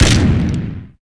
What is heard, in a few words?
GEMS Skull